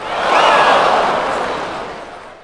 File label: OOH; GTA - Long Night